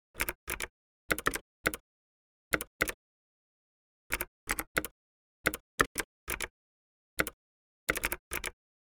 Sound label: Optical keyboard typing slow